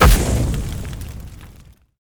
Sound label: tower dwarven flamespitter blazing trail impact